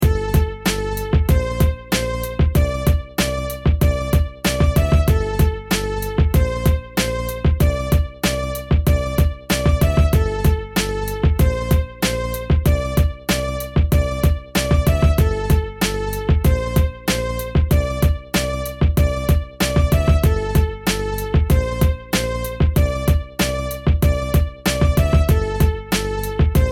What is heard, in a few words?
hip hop instrumental beats